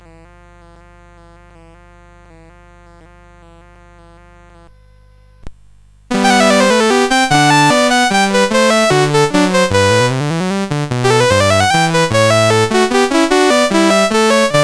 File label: Prelude in G CSG8580R5